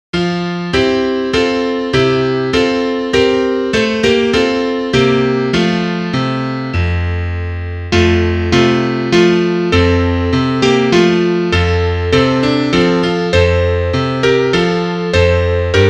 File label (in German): Klavier; wenn ich ein voeglein waer